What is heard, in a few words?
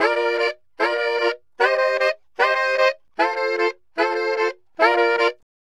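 Sax Section 076bpm Bb, Saxaphone; Quazibeat; African Secret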